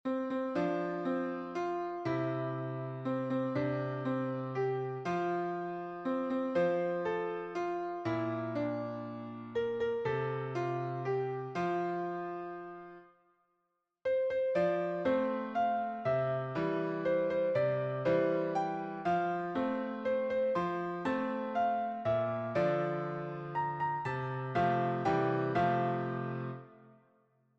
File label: Happy Birthday, piano